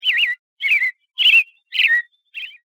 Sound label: robin